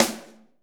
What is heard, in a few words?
SD 2H R, DRUMKIT